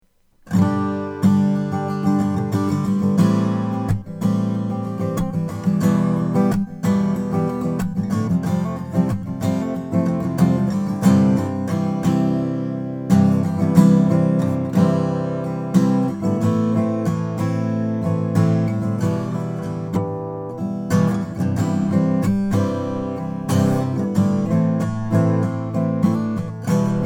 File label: mcpherson mg 3 5 bearclaw spruce